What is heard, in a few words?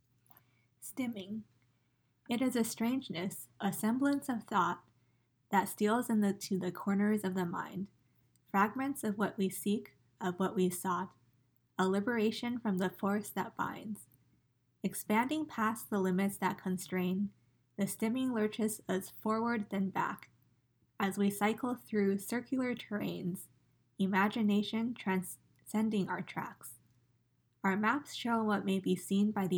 Stimming